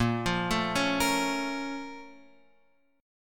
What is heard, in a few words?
Bb Minor Major 7th, Guitar, Open F; BbmM7; arpeggiated; x,5,5,4,4,5; JGuitar